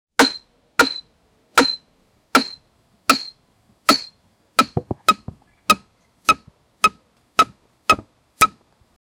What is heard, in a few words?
wood chopping sounds hamm; tools